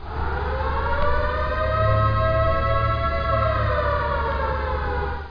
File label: air raid